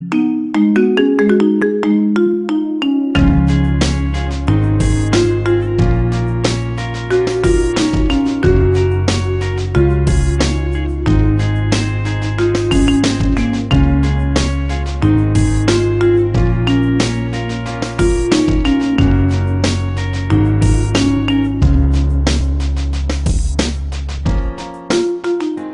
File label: marimba, ringetone